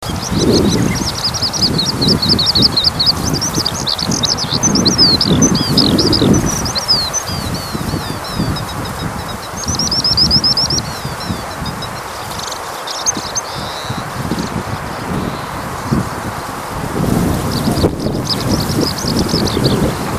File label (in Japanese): hibari